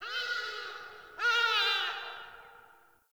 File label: Day Bird, ambience; One Offs; Glades Of Andurin